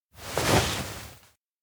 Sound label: deep bow; spica; anemoi